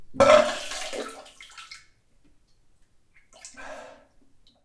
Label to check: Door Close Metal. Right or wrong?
Wrong